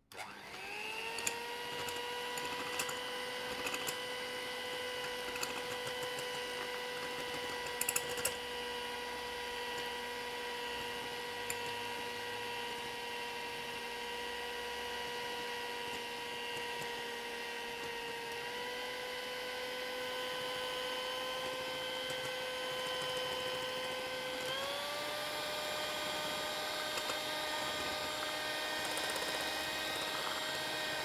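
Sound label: kuhonnyi mikser, kitchen mixer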